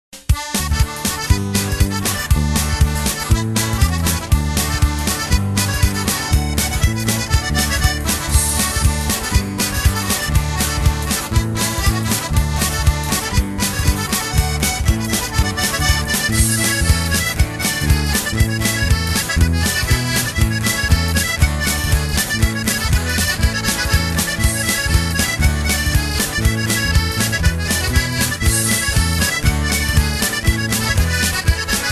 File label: Traditional Jig